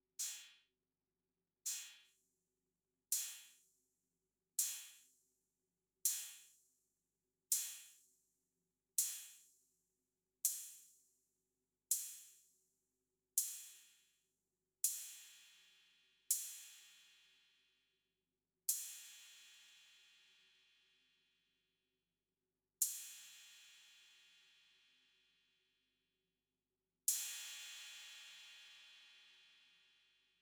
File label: Cymbal